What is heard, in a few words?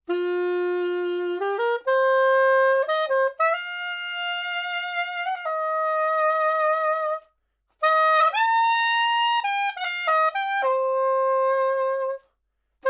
Sax Soprano 50cm R Mid